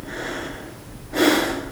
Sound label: male, Realistic Fatigue Voice; oblivion